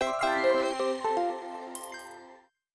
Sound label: game win